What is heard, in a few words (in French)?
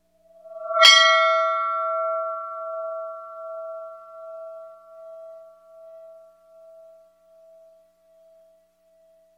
cloche attaque